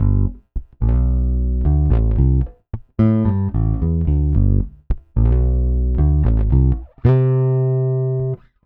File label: Pop, Bass; Sonic Foundry (Sony Creative Software); Bass X